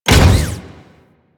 Moa Leap Land Sweetener; Warframe; Venus; Venus Terra Moa; Abilities

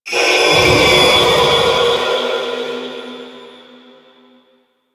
Sentient Aerolyst Death; Warframe; Sentients

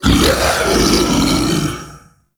Male Vocal Breath Heavy; Enemy; Mockeries; Sub Boss; Mockery

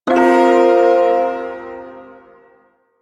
Ersatz Shawzin Hirajoshi Chord; Duviri

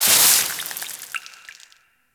Ocean Door Open Drips; Warframe; Grineer Ocean

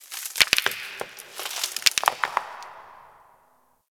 Ocean Rock Crack Small; Ambience; Warframe; Grineer Ocean

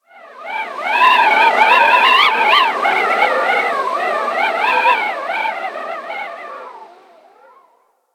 Ice Cold Wind Whistle; Ambience; Warframe; Corpus Ice Planet

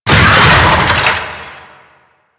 Melee Knock Down; Warframe; Necramech

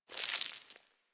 Settlement Sand Fine Debris; Ambience; Warframe; Grineer Settlement

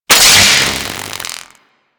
Broken Light Zap; Warframe

